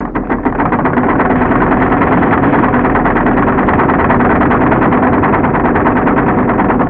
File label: turret turn